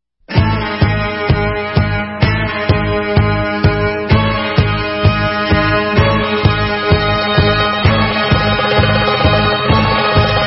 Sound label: Debka, Instrumental